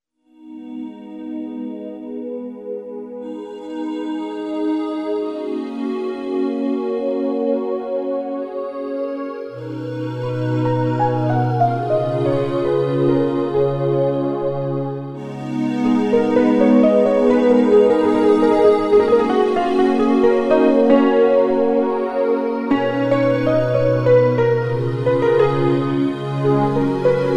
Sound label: glass reeds